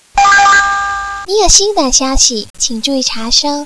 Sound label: sms; 经典短信铃声